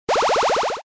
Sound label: Arcade 8 bit level up